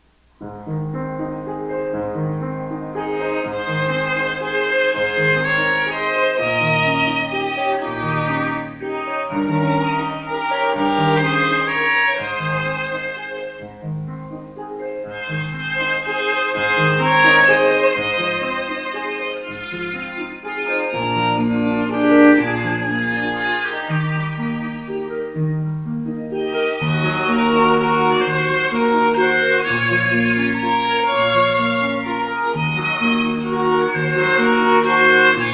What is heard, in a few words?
piano trio; On Wings of Music: Mendelssohn piano trio